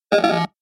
robotic glitch

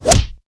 whippy hit; soundscrate

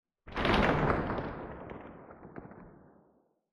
Explosion Blast with Debris; SoundsCrate

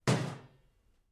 Metal Footfall; soundscrate

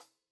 hi hat 14 inch; soundscrate

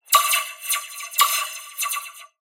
scifi weapon blaster accent multiple

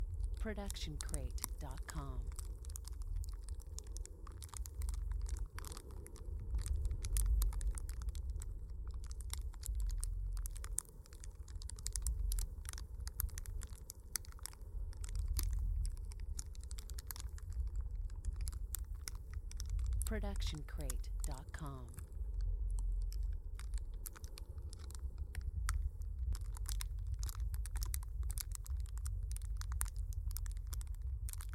loopable crackling fire; soundscrate

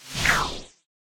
lightsword turning off; soundscrate

